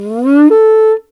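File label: Afro Horn Phrase; Roland LCDP11 Africa VOL-1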